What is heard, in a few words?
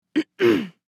Woman clearing throat